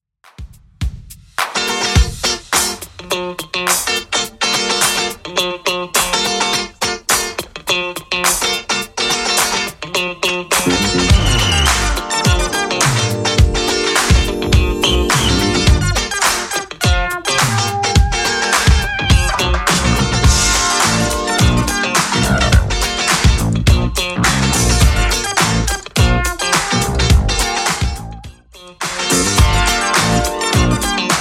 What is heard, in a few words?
70s Redrum 105bpm